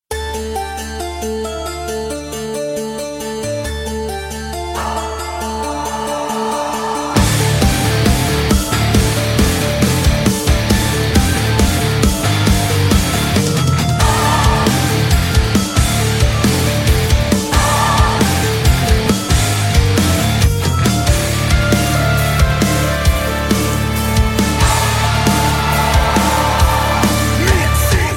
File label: super rock intro